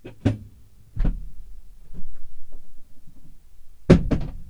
opening emergency avionics door in front of landing gear, Aircraft A320 - 321